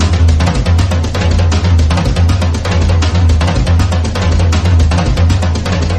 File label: drums; horde